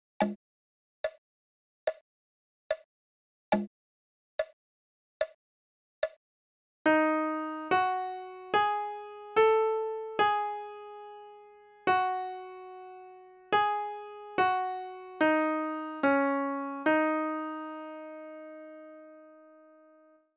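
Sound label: Blue Ditty Slo, piano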